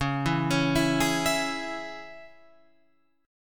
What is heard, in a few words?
C sharp Minor C sharp Guitar Open F, chord; arpeggiated; 13,11,13,11,11,11; JGuitar